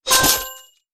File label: delivery success; KitchenChaos